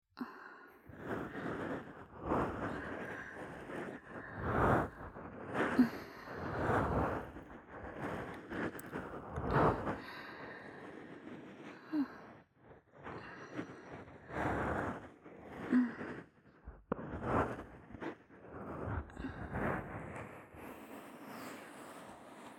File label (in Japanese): マッサージ; えろごろ！